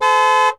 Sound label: Truck Range Rover Horn; Trucks